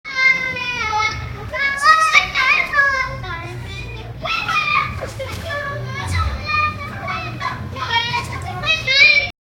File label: kids playing and dancing